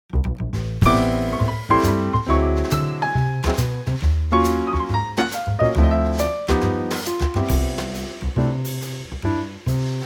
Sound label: Trio; Jazzville; key - C - vocal range - B to E; A superb Trio arrangement; loved by musicians to solo over.